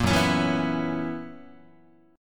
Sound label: Gb 11th, Guitar